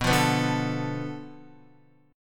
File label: B-Minor 7th Flat 5th B down Guitar; Bm7b5; strummed; JGuitar